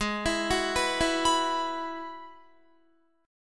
Ab Minor 7th Sharp 5th Ab, down, Guitar, 4E, 4A, 5D, 5G, 5C, 6F; Abm7#5; arpeggiated